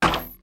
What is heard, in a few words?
Slingshot Shoot; Shoot with the Slingshot